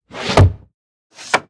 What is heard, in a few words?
window close ticket area train station slides